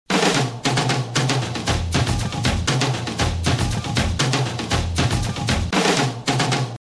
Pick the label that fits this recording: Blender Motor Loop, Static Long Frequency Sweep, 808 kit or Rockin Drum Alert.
Rockin Drum Alert